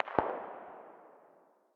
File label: dmr very far distance, 7.62x51mm; tacz-tweak-pack; taczmechanics